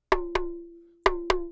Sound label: Lollypop Drum, Asia; Sonic Foundry (Sony Creative Software); Continental Drift Aftershocks